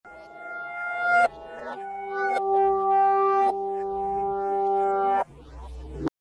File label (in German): Geisterschloss Klangstueck